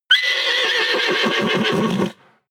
horse neigh agitated; natur-tiere; pferde

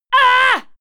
female fight attack hit

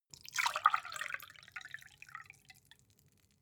glass pour soft; soundpool

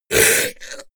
zombie grunt choke male

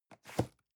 cardboard box medium place slide soft; objekte

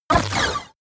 sci-fi laser shot short; krieg waffen

